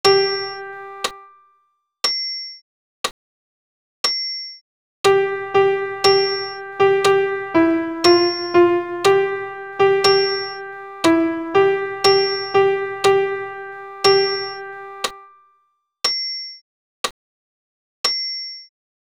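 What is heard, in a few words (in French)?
Et in spiritum alto piano seul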